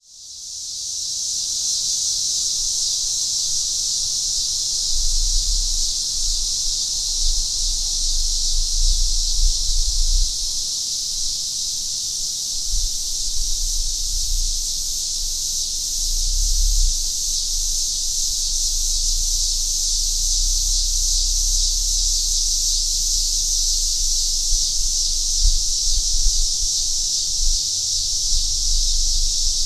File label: Eastern Scissor Grinder chorus, cicadas